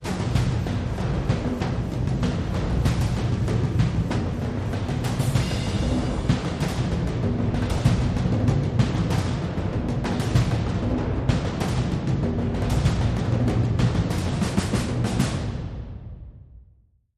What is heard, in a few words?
Thriller War Drums